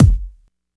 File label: focused kick single shot f sharp key; kick drum samples